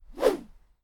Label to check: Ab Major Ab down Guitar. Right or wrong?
Wrong